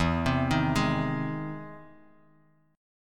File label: E Augmented Major 7th E down; E+M7 arpeggiated; JGuitar